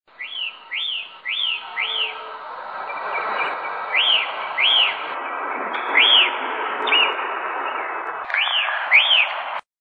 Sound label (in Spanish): voz calandria grande